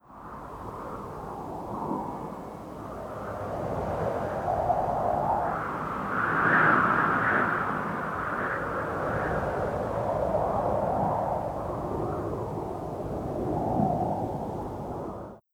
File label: Molokai Wind